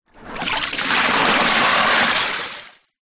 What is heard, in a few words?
transport boat dinghy rubber small water tip out